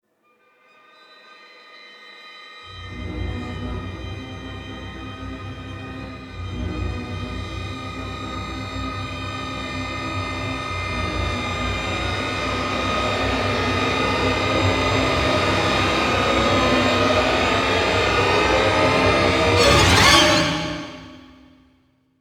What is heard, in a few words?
Epic Orchestra